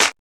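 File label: clap; E-Lab; X Static Goldmine 1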